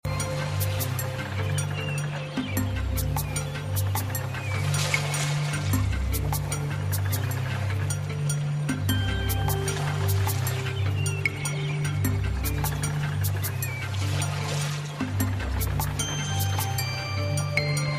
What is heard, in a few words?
warm sun cool mist background music tone ringtone